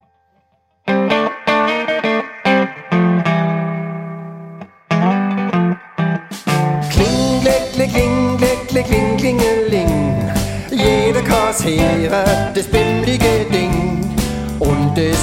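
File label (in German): Kling Glöckles Rock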